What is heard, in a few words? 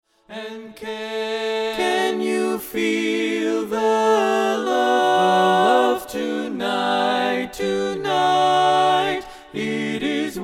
female